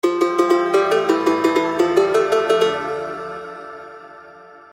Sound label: Sitar SMS Ringtone